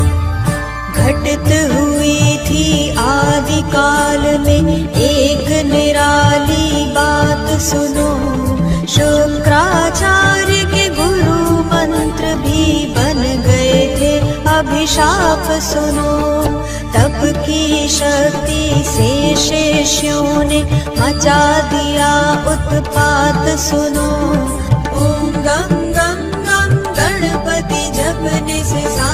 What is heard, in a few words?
Ganesh Bhajan